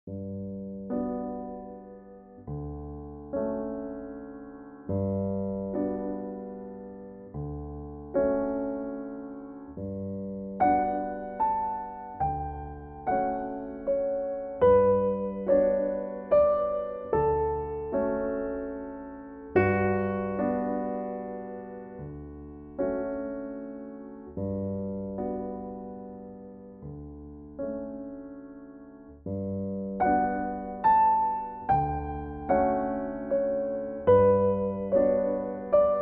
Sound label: Gymnopedie Steinway; piano; Garritan Steinway; Gymnopédie No. 1